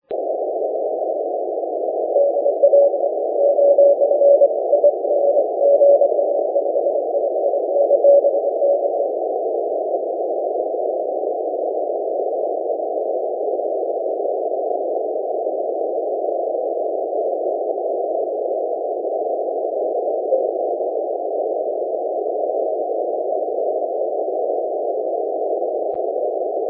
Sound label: 6m cw